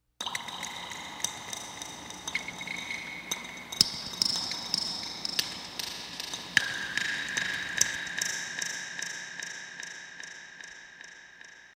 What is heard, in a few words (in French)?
prison goute echo